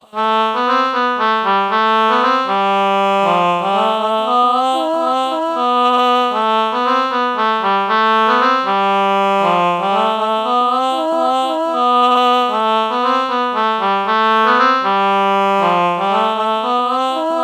ah oboe melody morph low

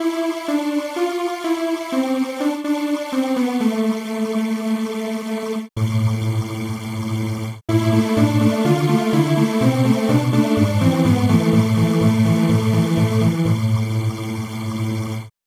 halo pad

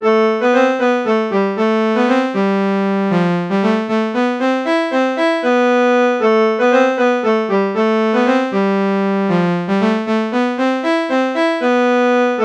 reed organ synth saw false melody morph low

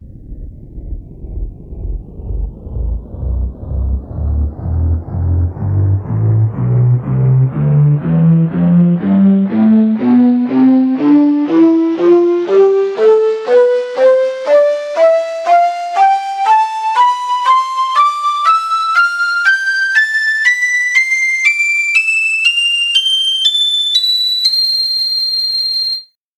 shakuhachi tonleiter

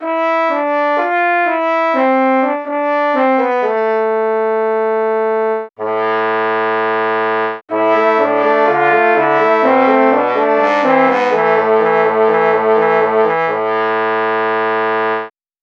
trombone

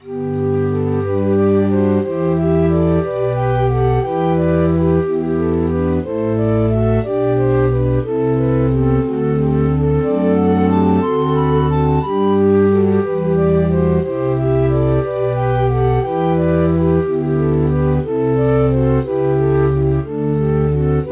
piccolo

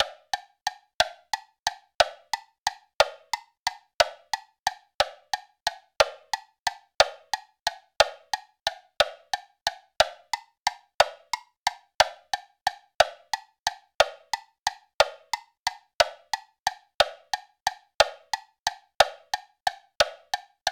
woodblock

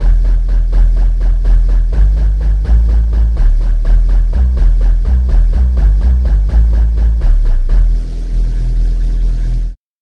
shakuhachi; bass

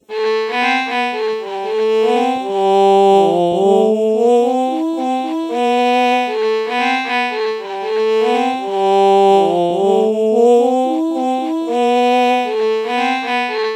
oh violin melody morph low

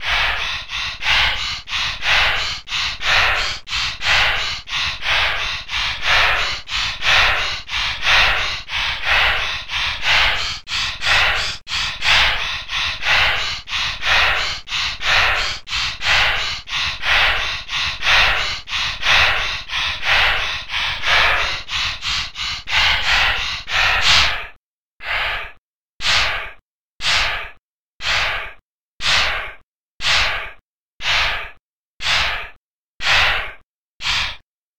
breath noise

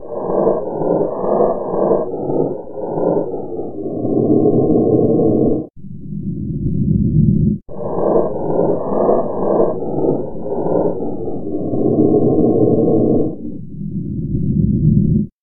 breath noise

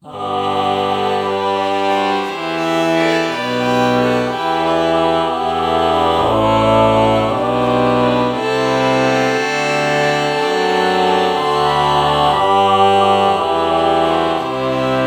ah violin morph

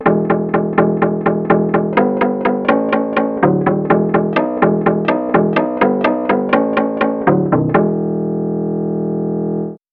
shamisen bass

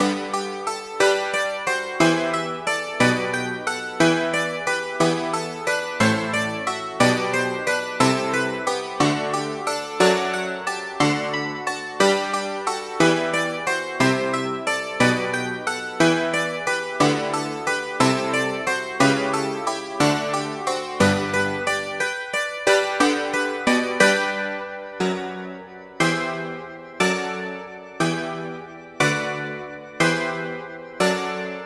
dulcimer

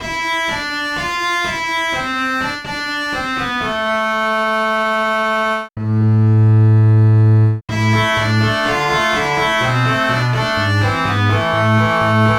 contrabass

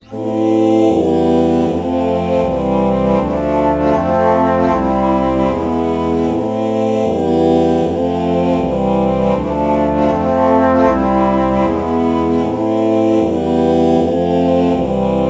alto flute oh chord morph

